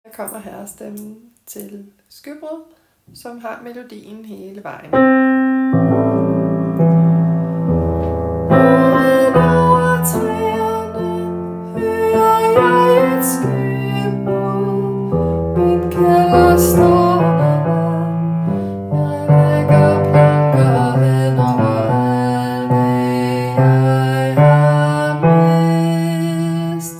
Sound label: T B